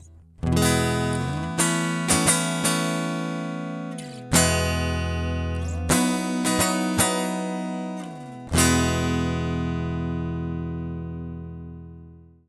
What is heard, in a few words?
Portico 5024 DI silk egtr